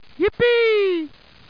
match; KidPak

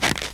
step; wob2 demo

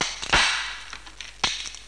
CRACK; RenderOrgan

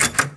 door wood locked; mechanics; Spearhead Demo; MacFormat 2003 June

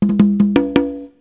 djembe; 22,050 sample rate; MOBICLIC

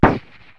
oneshot, cartoon guns; KABOOM! - Wild and Wacky Sound Software

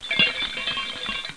Chimes; Let's Discover New York

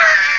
aaah

